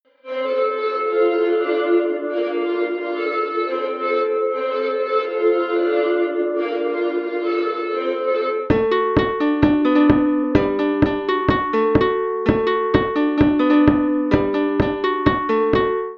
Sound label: dancing all night